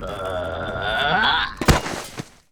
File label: WAR DIE, Mob